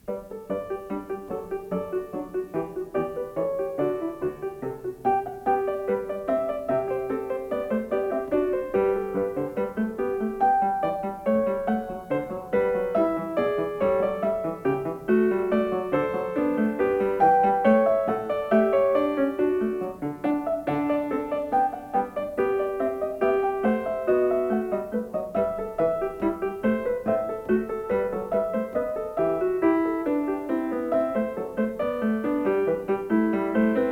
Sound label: Variation 2 a 1 Clav; Glenn Gould; Bach; Goldberg Variations; Sony-BMG, 2007; Variation 19 a 1 Clav 1955 Version